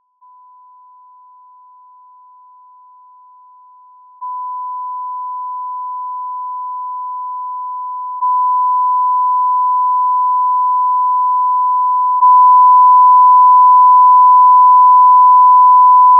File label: volume step 5stage